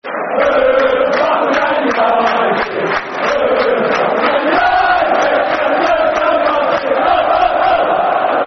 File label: Football Chants (Soccer Song); Who The Fuck Are Man United; Chelsea Cfc